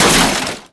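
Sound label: tool chest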